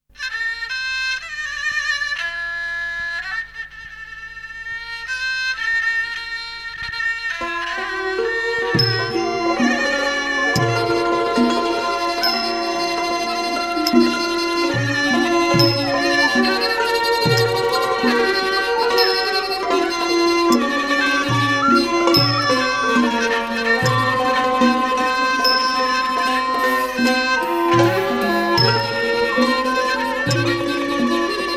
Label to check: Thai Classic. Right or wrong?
Right